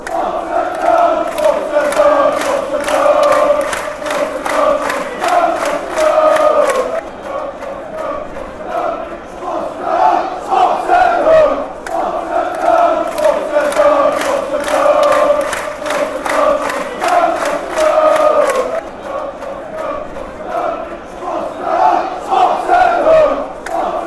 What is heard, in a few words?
den haag chant